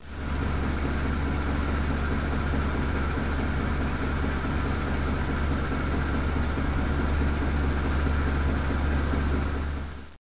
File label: drum roll; Broderbund Print Shop Multimedia Organizer Deluxe Edition